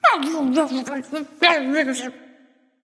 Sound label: grumble; smite; voicepack; medusa